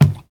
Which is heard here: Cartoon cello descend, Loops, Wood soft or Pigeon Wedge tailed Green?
Wood soft